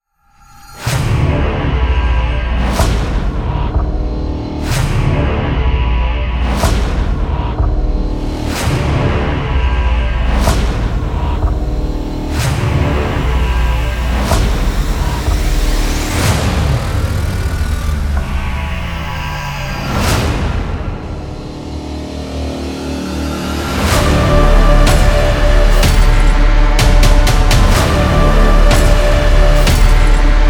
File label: Dark Trailer Intro, scary background music